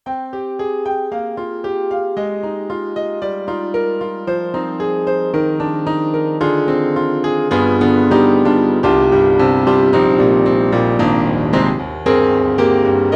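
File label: YAMAHA MU1000EX, Grand Piano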